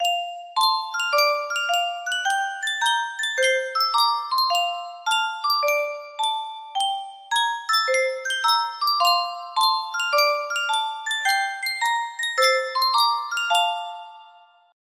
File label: sankyo music box, melody